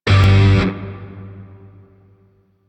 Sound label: Shawzin Pent Min Chord G; Grineer Shawzin; Warframe